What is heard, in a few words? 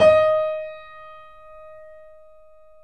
3D Boesendorfer; E-MU Producer Series Vol. 5; 3-D Audio Collection